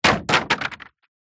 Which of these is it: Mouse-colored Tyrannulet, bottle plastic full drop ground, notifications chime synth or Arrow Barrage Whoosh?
bottle plastic full drop ground